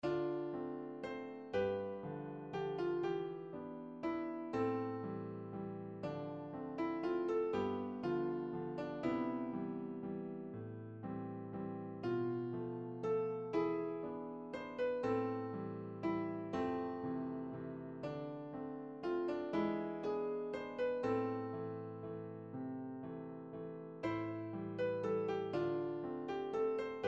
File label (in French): Notre amour va et vient piano